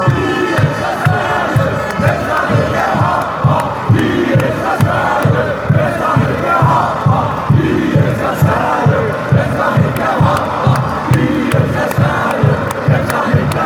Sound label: denmark chant